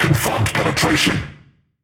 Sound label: Hit Confirmation; World Of Warships